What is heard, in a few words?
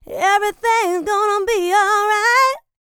LICKS, Vocal R&B & Dance; ILIO; Vocal Planet